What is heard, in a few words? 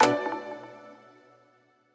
click close; 『Mikan』